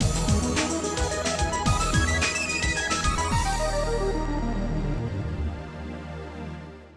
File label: All Clear; Aqua Rush; Namco System 12; arcade